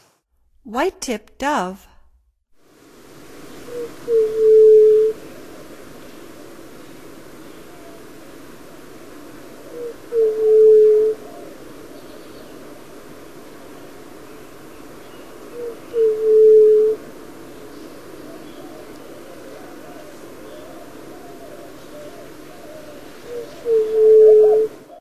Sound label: White-tipped Dove, Songs of Birds of North America; Stokes